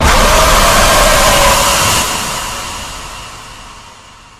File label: jumpscare sound